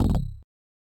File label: player death; clash and crowd